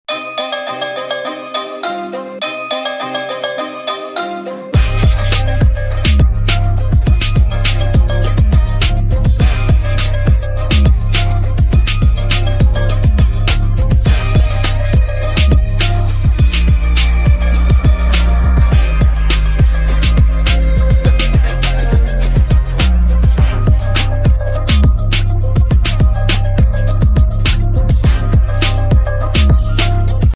Instrumental

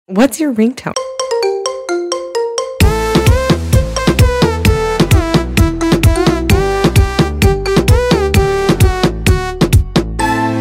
iphone 13 pro max

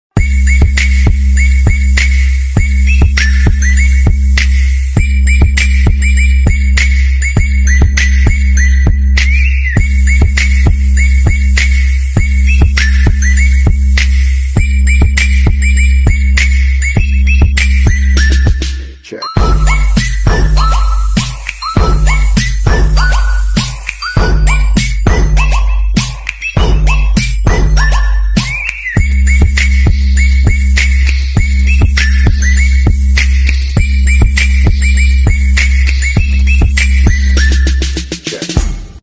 Jingle Bells Remix; dzwonki na telefon

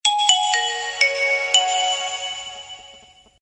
Nokia Connecting Jazz

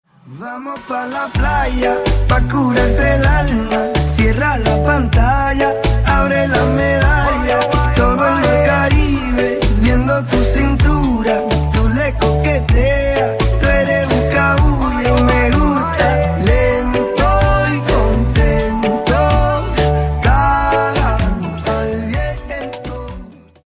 La Playa Guitar

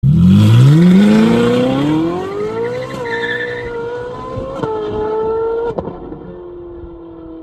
Ferrari 458

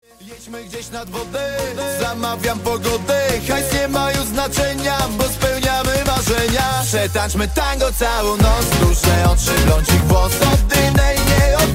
tango

